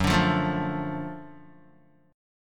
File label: Gb Minor Major 7th Sharp 5th Gb down Guitar; strummed; JGuitar